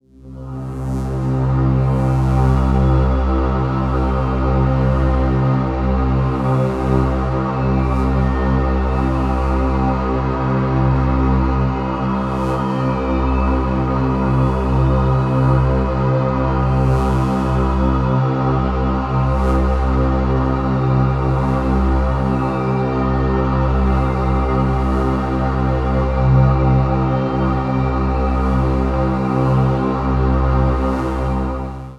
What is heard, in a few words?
Drone Infinite C; Ghosthack